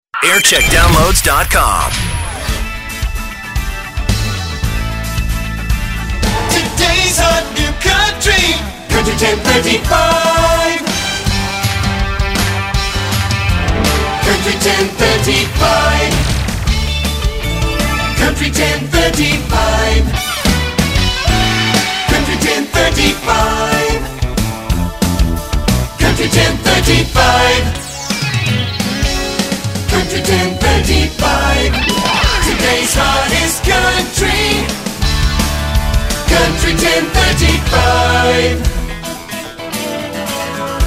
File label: jingles; Country 1035 London; Jingle Montage (Thompson Creative) 1994; RTL Country 1035